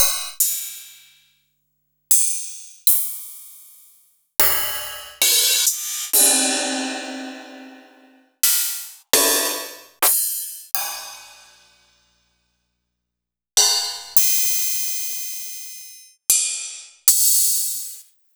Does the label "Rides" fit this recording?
Yes